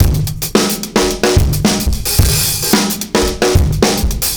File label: R&B Drums